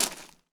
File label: gravel; firefight